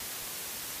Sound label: Static